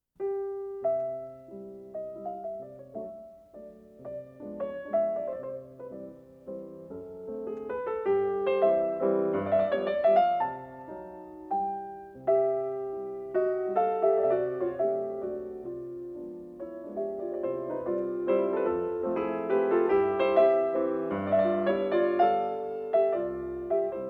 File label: Mazurka No.44 in C major, piano